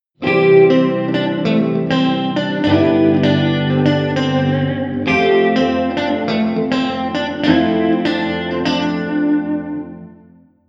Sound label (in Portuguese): Cadencia C G F F